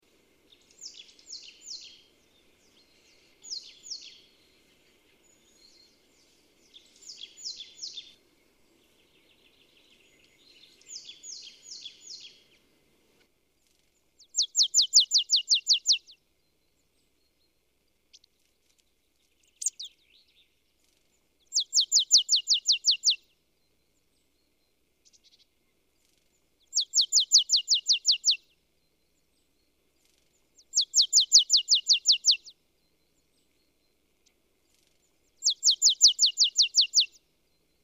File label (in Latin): Poecile palustris; SETE